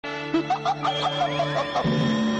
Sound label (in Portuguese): risada; frieza